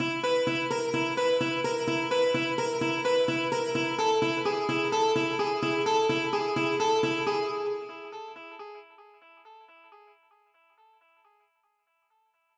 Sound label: Acoustic Guitar